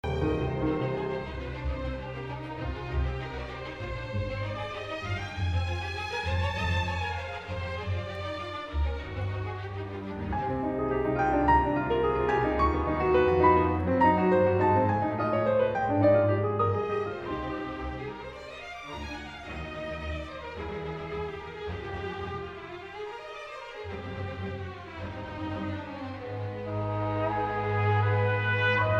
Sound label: Piano Concerto; Shostakovich